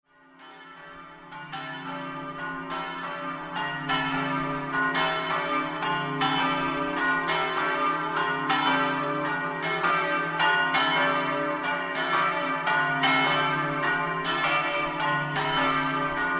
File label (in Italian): MADRISIO, campane